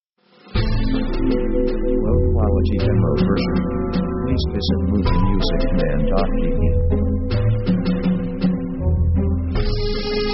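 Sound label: orchestra romantic waltz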